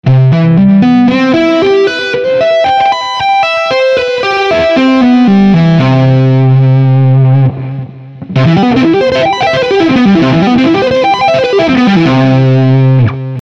Do maj7

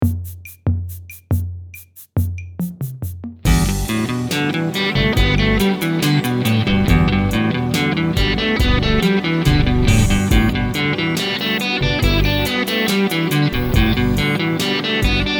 esercizio 70 bpm, guitar

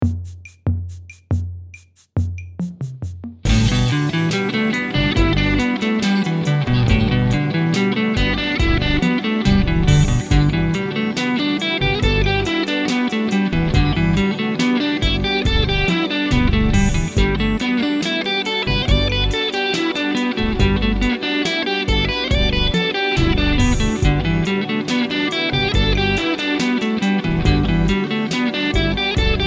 esercizio 4 - 70bpm, guitar

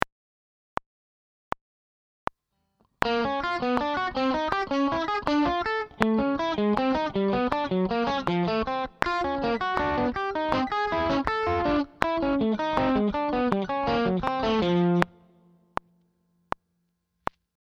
Esercizio, guitar

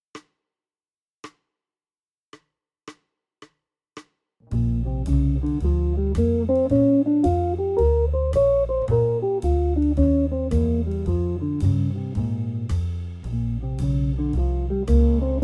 Pentatonica Blues Maggiore di Bb, guitar

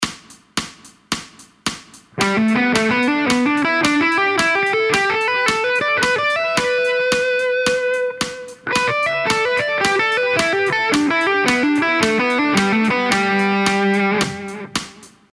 Esercizio Pentatonica maggiore terzine, guitar

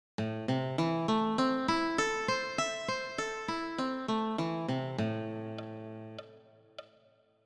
Esercizio 2 A minore arpeggio, guitar

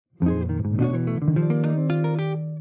Arpeggi Irregolari

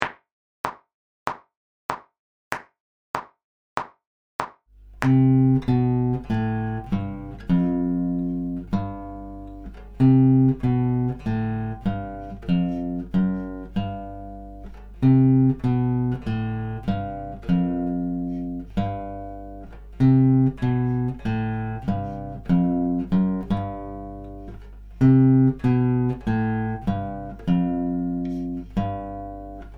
Giro di basso

